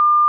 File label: ding